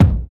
dry steel kick drum sound g key, kickdrum sample tuned to the G note; kick drum samples